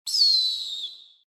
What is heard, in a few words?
hawk